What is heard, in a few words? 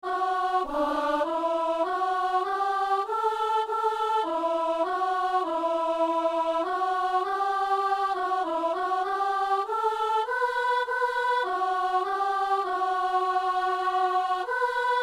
soprano